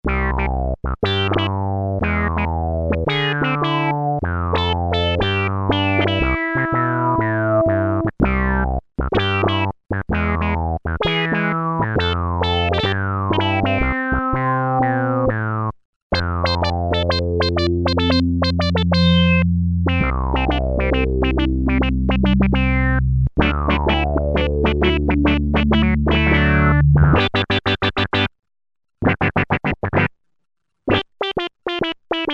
Meow Bass, Roland Juno-106; Factory Preset

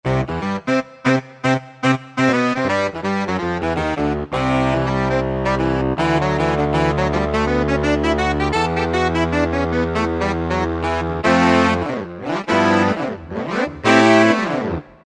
WN Saxion, Yamaha TG77; Factory Presets

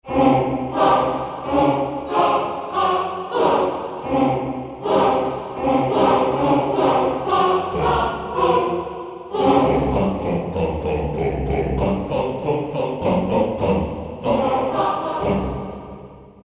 CHO - Ha-Hu-Fa Tons; Best Service Gigapack

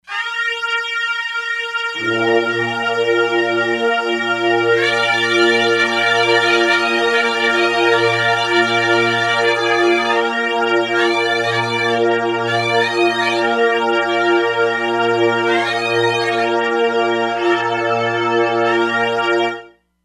Mizmars, E-mu Proteus-3; Factory patches

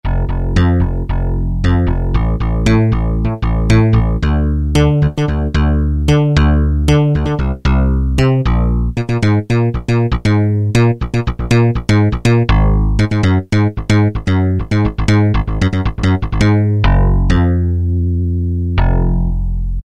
Synth Bass, Roland U-110; Factory Patch

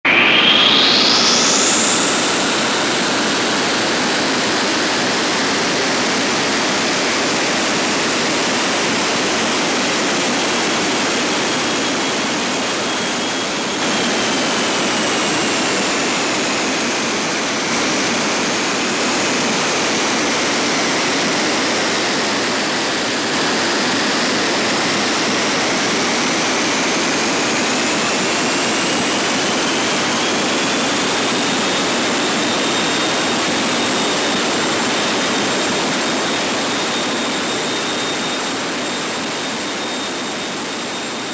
JET, Oberheim OB-8; Factory Patches

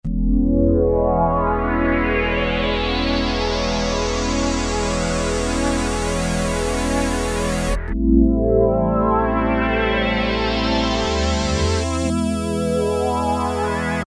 Space Sound, Roland Juno-60; Factory presets

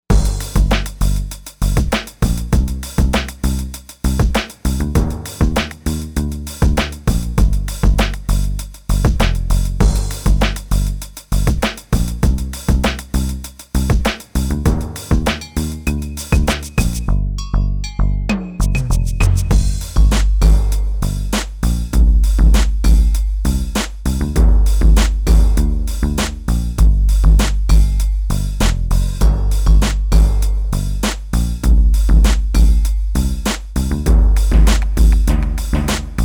AKAI XR20 Factory patterns; PAYBACK

